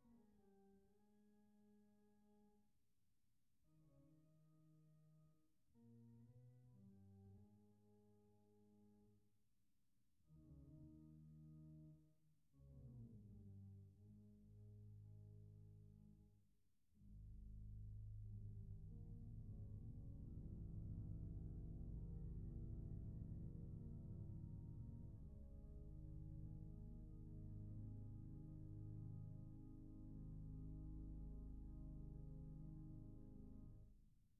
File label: Mars Organ